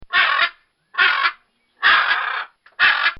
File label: Blue Amazon Macaw